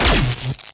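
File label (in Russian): выстрел бластера в межгалактическом пространстве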